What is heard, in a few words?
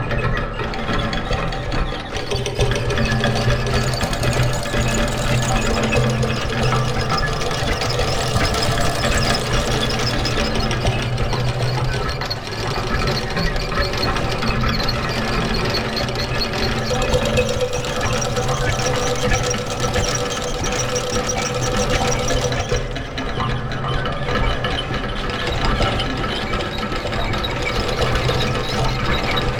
Mechanical Gears